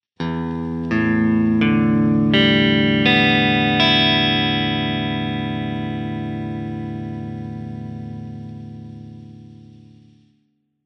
Cordes à Vides

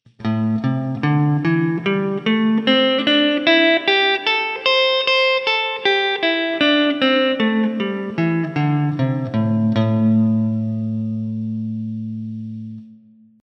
Gamme Pentatonique La mineur

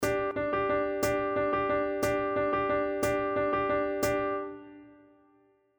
Ternaire duolets de croches croches

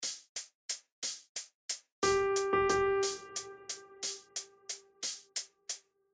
Sicilienne Ternaire

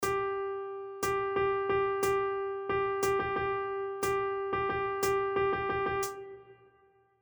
Ternaire

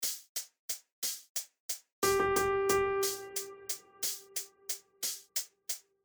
2 Double croches 2 Croches Ternaire

